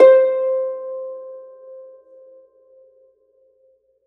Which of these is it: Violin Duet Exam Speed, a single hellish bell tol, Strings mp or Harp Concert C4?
Harp Concert C4